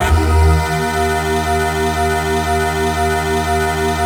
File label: synth clav